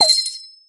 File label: miss; Brawl Stars